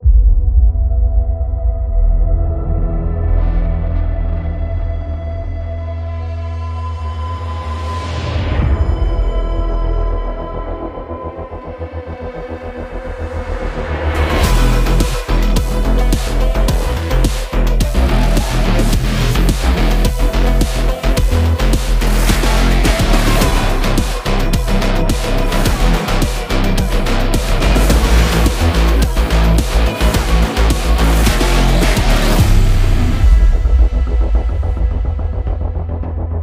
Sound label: Cinematic Trailer - Guitar Version; Spine